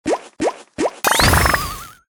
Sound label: attack; hero